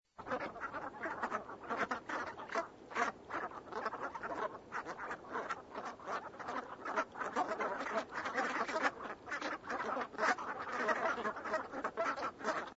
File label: Brant